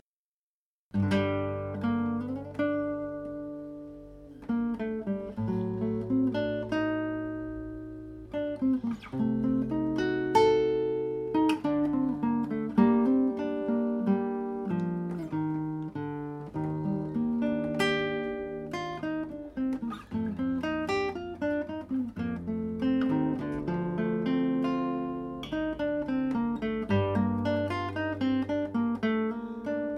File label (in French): suite violoncelle; Johann Sebastian Bach